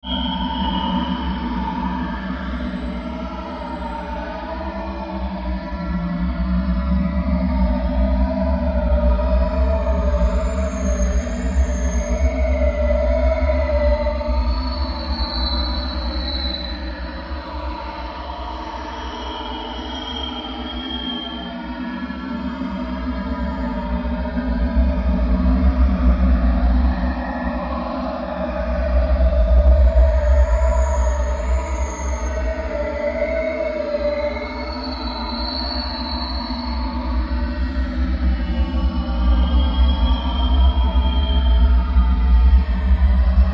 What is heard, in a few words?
Sci-Fi SFX